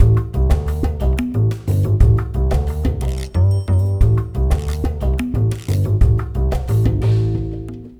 Now Loading; Donkey Kong Country Returns HD

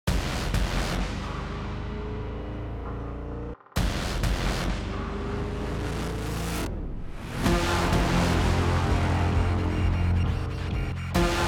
Event Suspense Heavy

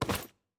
Item Take

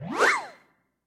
Block Shuffle; In Block Shuffle

